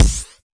fx drum, Electro FX

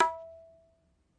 Ta, Tabla; ETHNIC and WORLD PERCUSSION; Tabla Kit Large

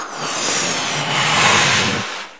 inflate balloon; Sound FX Real Life

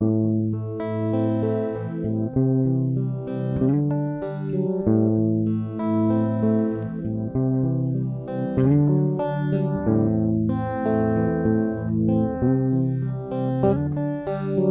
a minor guitar pluck intro; GUITAR LOOPS

